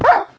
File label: hurt, wolf; minecraft; mob